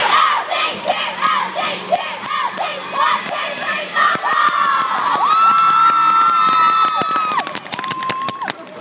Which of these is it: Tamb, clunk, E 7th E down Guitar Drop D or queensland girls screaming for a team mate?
queensland girls screaming for a team mate